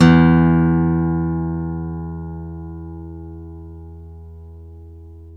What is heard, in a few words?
STEEL P E1, Synclavier, GUITARS; Synclavier Sampler Library